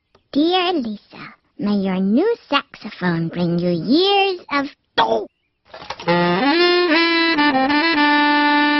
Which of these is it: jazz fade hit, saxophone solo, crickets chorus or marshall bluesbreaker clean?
saxophone solo